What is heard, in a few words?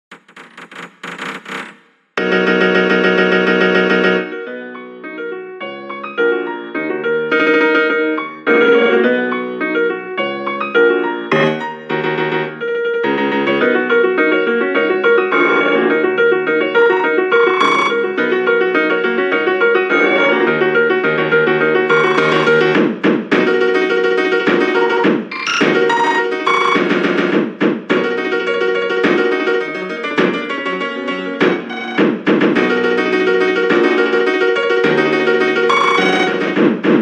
Piano